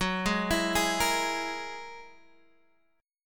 Ab 7th Suspended 2nd Sharp 5th, Gb; Ab7sus2#5/Gb arpeggiated

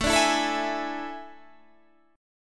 A Major 7th Suspended 4th, Guitar, Standard; AM7sus4; strummed; JGuitar

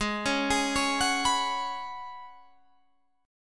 Db 7th Suspended 4th Ab, guitar

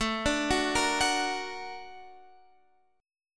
Bb Augmented Major 7th A

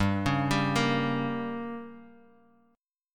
G Diminished, Guitar, Drop D; 5,4,5,3,x,x; down

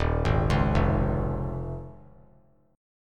Eb 7th Suspended 2nd Suspended 4th Eb; 3,3,4,3; Eb7sus2sus4 arpeggiated; JGuitar

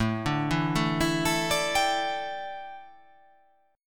A 7th Suspended 4th, A, down

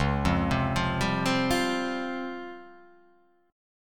C sharp Major 7th Flat 5th, chord

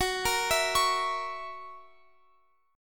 Gb 7th Gb down Guitar Open F

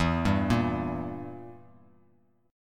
E Diminished, Bass Standard; Edim arpeggiated; x,7,5,3; JGuitar

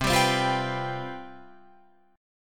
C Major 7th Suspended 4th C down Guitar Open F; CM7sus4 strummed

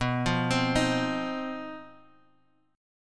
C Minor Major 7th Double Flat 5th B

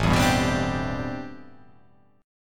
A Minor 11th A, Chord

